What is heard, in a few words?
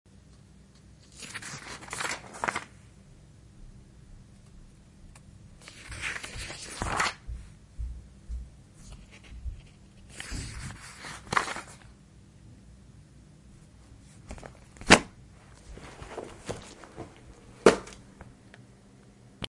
page turns